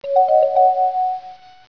Flute; MacAddict; iMOnTime 1.0.2

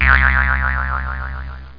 Cartoon boing

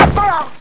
chicken hit

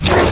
Menu

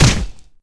Death Hit; Civilization4

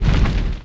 Arrow Fire; Civilization4

